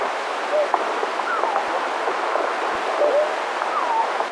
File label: Turtle peeping vocalizations; turtle nests